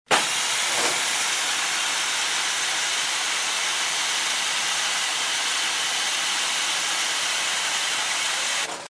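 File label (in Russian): звук выпуска пара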